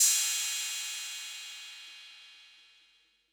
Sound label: 808 Cymbal; Concrete Collage Drum Kit; Concrete-808